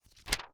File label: Household Book Hard Cover Book Flip Through Single